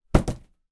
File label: foley cardboard box drop on floor light small